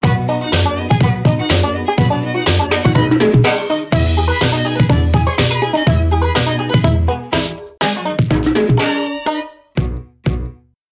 Academy Statue; Pipsqueak

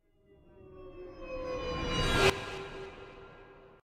System Alert; Terra Invicta

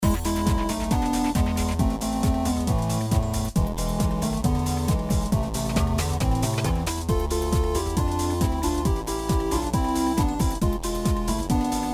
Classic Music; Tetris Elements

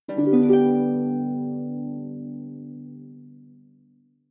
Harp

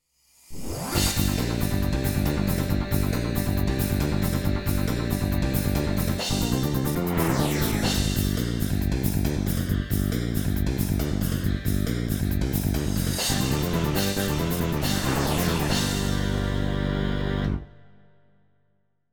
BGM Homerun; World Series Baseball 2K1; Dreamcast

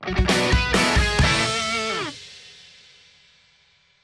Small Celebration; Disney Infinity

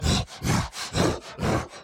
Enraged Breathing Loop; The Town; Burns

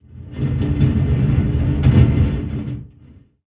random pipe; devour

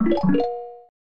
Start Fanfare; mario paint game rip

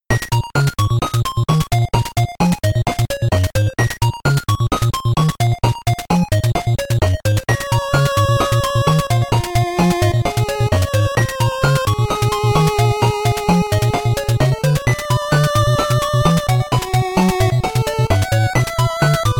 BGM INTRO 8bit; Mighty No. 9; 8-bit soundtrack

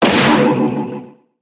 block; Dullahand block; Fight Knight

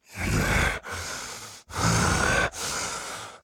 Walk Cycle Burns; The Town

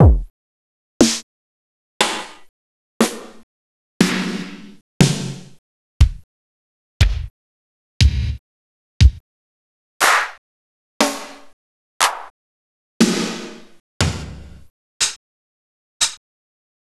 Percussion Test (PCM); gamerip arcade; Armed Police Batrider; Raizing

